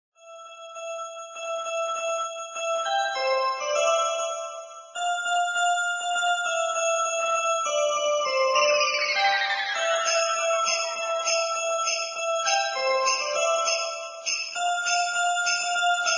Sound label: Jingle Bells Remix Instrumental